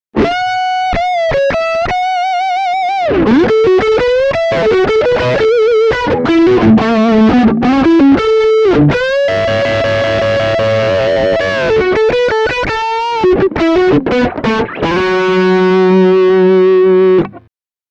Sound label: PAF Neck Drive